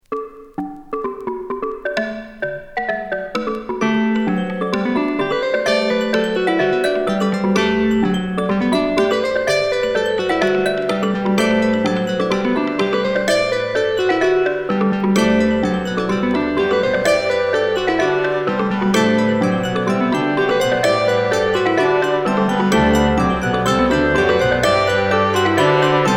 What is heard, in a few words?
rimarimba